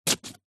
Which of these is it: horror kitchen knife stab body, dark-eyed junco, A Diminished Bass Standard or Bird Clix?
horror kitchen knife stab body